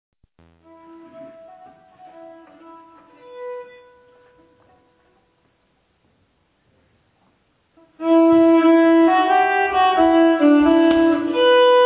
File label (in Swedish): Shottis, nyckelharpa; Shottis Villemann